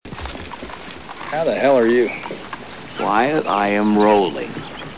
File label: rolling